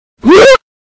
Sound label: OOf